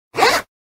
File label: zipper sound; zipper down